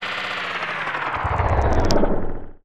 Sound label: Sci-fi Engine Vroom Down